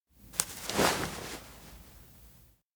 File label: sew needle panic; anemoi